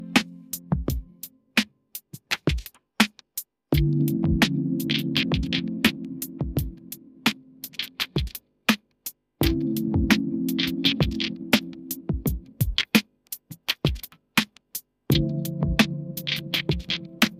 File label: ringtone lofi